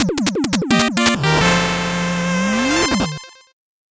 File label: 8bit jingle normal